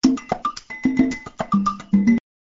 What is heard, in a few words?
drum